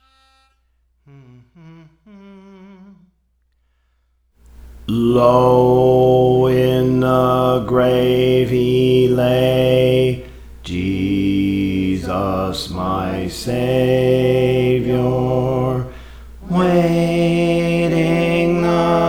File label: Bass; Praise For The Lord Training; Low in the Grave He Lay